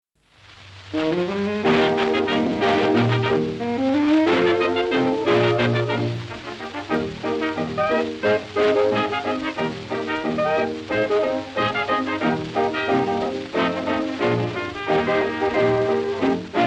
Instrumental Version

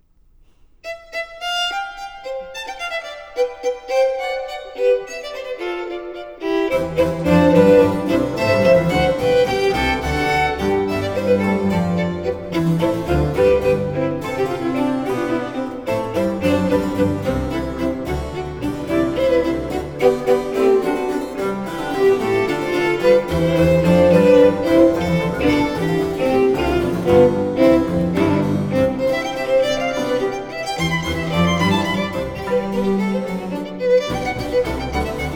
Violin Sonata in D Major, V. Allegro; Corelli; Violin Sonatas Op. 5; La gioia; Lina Tur Bonet; Musica Alchemica

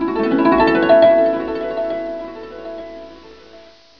HARP

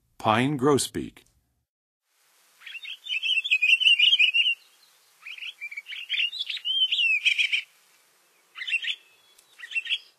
Pine Grosbeak, Western USA Songbirds; Nature Songs; N. America; Songs of Birds of North America

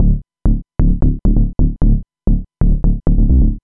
bass, dance

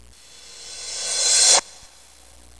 CYMB REV; MUSIC; PERCUSSN

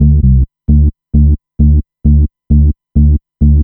bass, dance

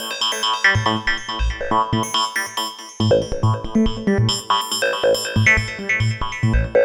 Dove Kiss, Synths Lead

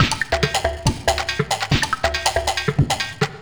Perc Loop, Dance, Beats

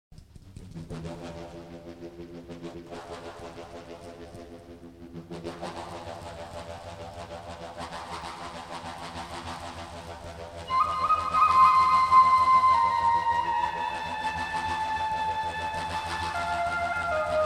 DRONE ZONE; New Age

